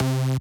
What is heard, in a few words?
bass